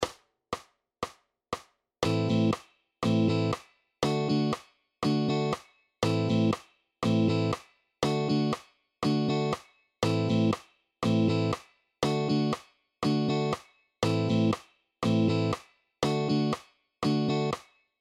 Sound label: Rhythm guitar exercises